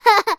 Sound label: human boy 3 years old british laugh